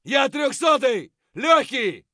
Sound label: hurt light; Escape from Tarkov